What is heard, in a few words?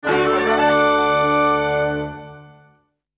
Cartoon, transition